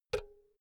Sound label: power cord heavy insert remove; objekte; elektronik